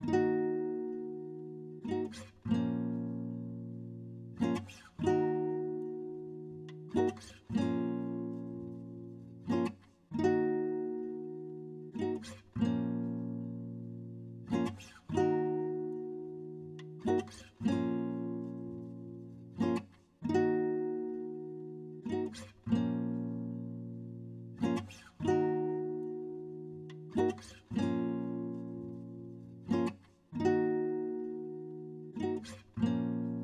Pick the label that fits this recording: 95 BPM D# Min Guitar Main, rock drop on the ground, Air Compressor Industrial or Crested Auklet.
95 BPM D# Min Guitar Main